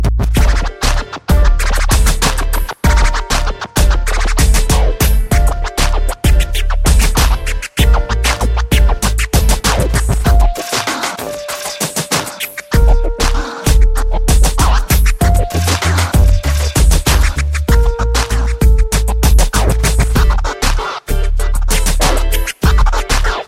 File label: scratch